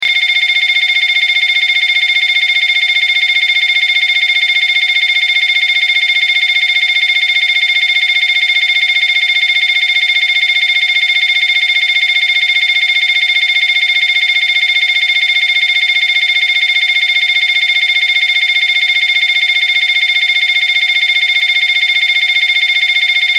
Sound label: fMRI